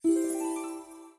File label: popup up; ui; mofa; lineageos